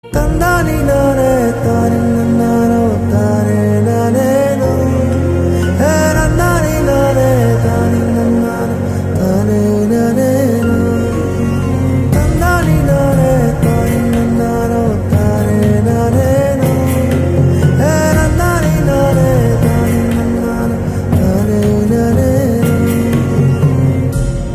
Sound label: Humming Ringtone; KGF